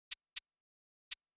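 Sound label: 808 maracas; hydrogen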